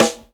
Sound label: SD 70 S; E-Lab; X Static Goldmine 2; akai